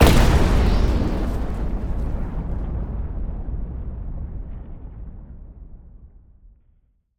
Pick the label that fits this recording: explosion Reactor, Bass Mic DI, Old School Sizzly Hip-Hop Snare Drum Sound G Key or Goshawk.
explosion Reactor